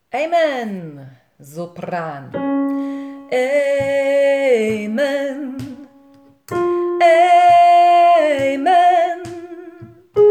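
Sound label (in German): Amen sopran, gospel choir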